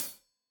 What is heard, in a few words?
Hat Tight, Hi-Hat, Cymbals; Deeply Sampled Drums